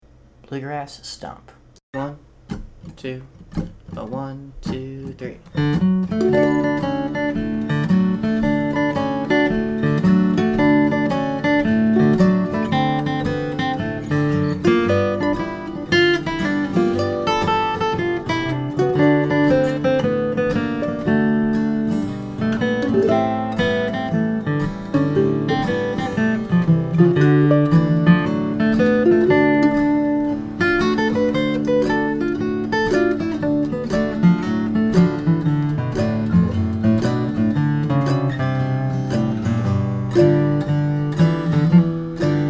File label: Stomp